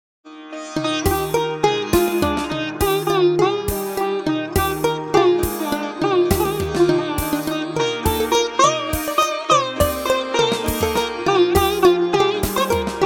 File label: Waltz itar